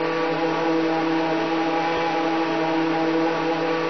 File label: engine idle rpm